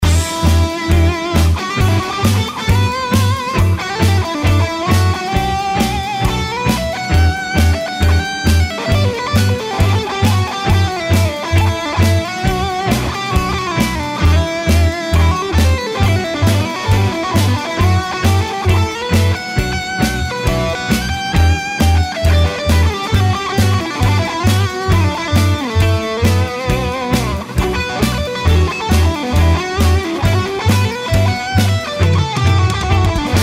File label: solo plate background louder no delay, guitar